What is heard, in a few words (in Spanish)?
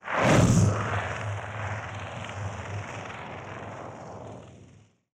explosion fuego